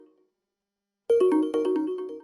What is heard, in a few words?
ringtone